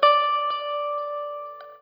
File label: NOODLE LONG, Guitar & Bass